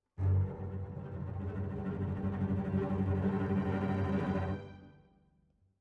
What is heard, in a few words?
Cellos